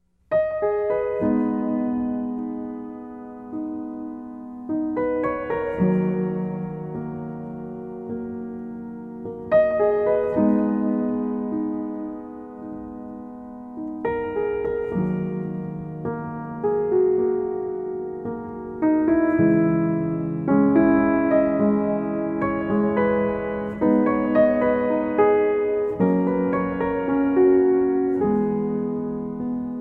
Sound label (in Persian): اهنگ زنگ غمگین گیتار